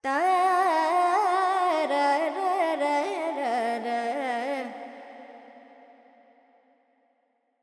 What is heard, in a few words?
FX Dorian, Vocals, Carnatic Female, Alaps, 70 G